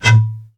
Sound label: Blow Bottle Mortar Shot; Weapons